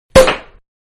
Champagne Cork Open; Bars

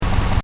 Sci fi machine loop, Scifi Machine: Loop, Short; Control Panels; Control Panel Sound Effects; Control Panels (Sound Effects:Science Fiction); Stuart Duffield

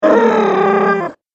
Zombie - Scream; horror; Zomby Sound Effects

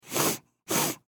Human Male Sniff Twice; Sick

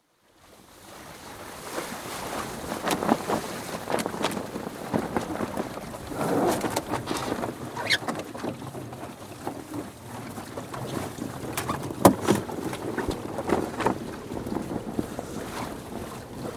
Sailing Dinghy, going about (Recorded on board); Boat Sound Effects; BBC

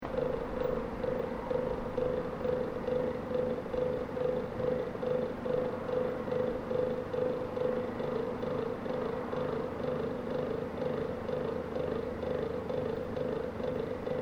Industrial Heater Exhaust Port; Various industrial machines; Machinery (Sound Effects:Machines)

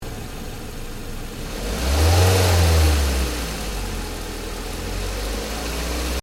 Car Ford Focus Idle Rev Engine Bay; Ford Focus hire car; Cars (Sound Effects:Vehicles)

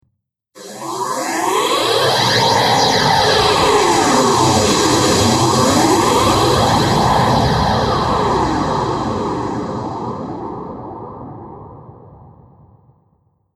Powerful Space Engines, Spacecraft Sound Effects

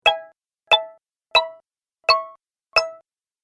App Menu - Five Sounds, Ping; Multimedia

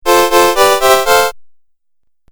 Game Music Phrase; Video Game Sound Effects

